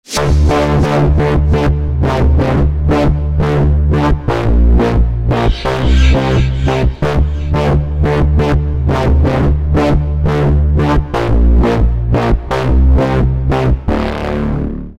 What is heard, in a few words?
bassline